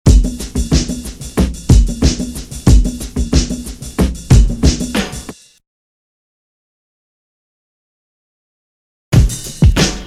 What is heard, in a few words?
Drum loop; BEST OF DRUM BREAKS 30